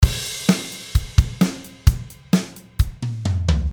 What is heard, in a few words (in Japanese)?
drumフィル